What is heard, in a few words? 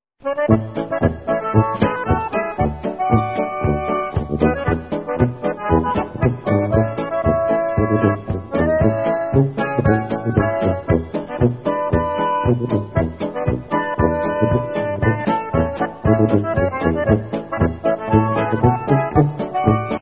FOUR BEERS POLKA